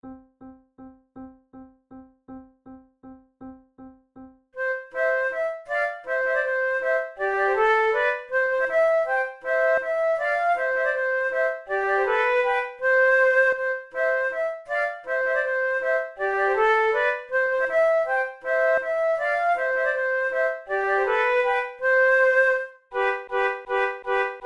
waltz trio, flute

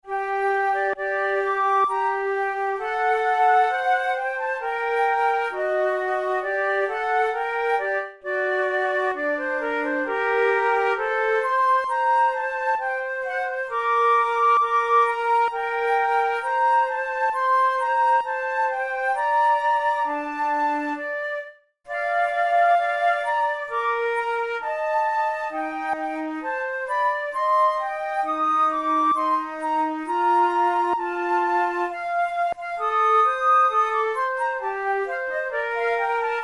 sonata adagio, flute

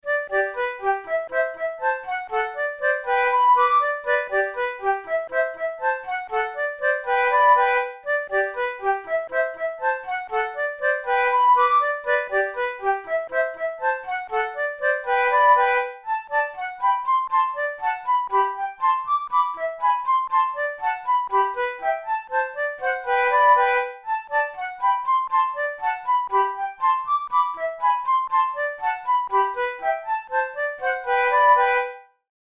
pour la flute duos allegretto; devienne; nouvelle methode

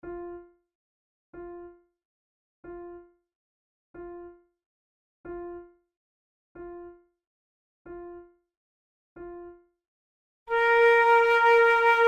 flute sonata largo; Mattheson; flute tunes

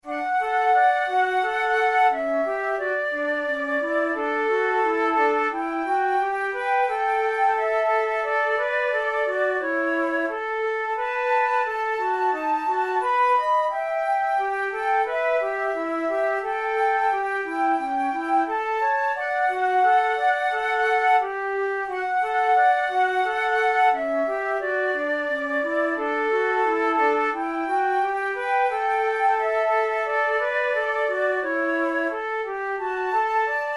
duets, flute; kohler; forty progressive duets vol1 no5

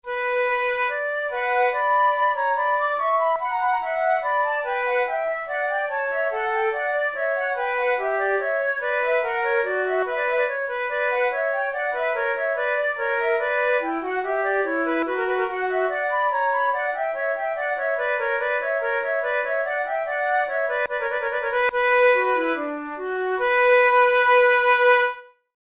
prelude, flute

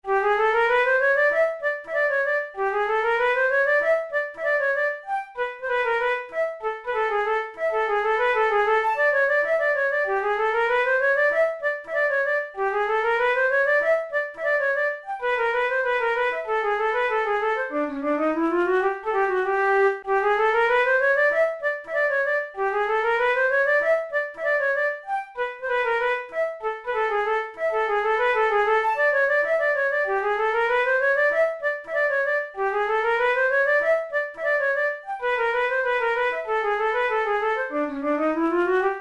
chromatic polka, flute; kohler

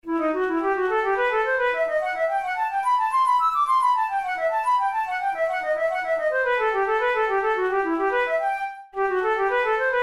studies for the boehm flute; drouet 72

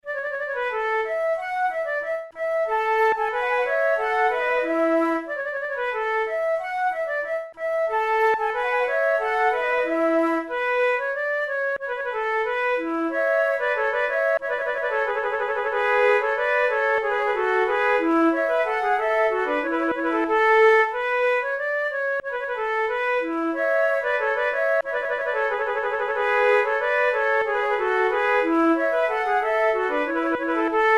two flutes gavotte a la maniere francoise; Monteclair; 6 concerts; flute tunes

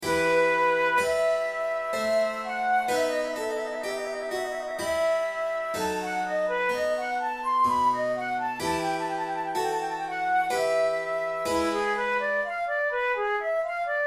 flute sonata in e minor grave; handel; hwv375

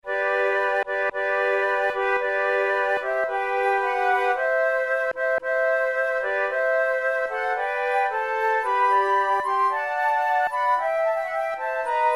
trio

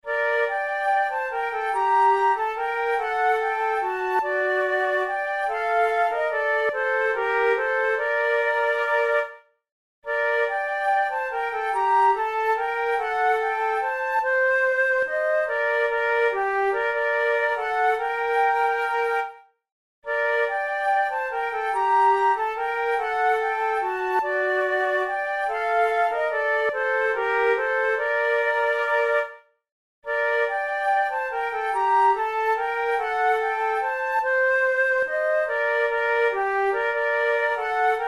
claudine lived contented duet, flute

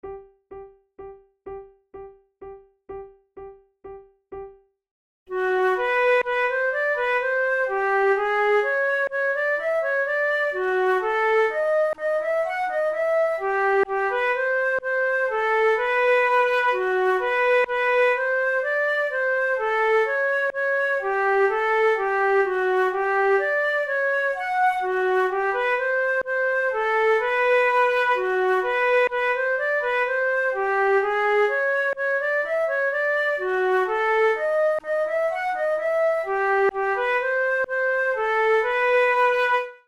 duet, flute

